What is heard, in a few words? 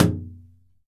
shower, impulse responses; howard fredrickson